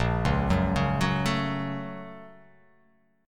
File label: A sharp Minor Major 7th Sharp 5th A sharp